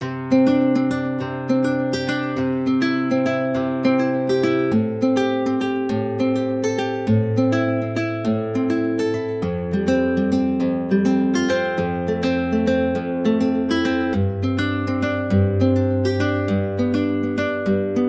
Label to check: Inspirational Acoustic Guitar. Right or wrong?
Right